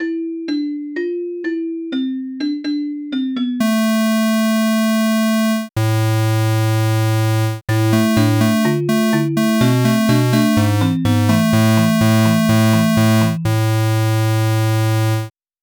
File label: crystal